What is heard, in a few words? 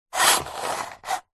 table tennis portable retractable net pull open out plastic housing fast; zapsplat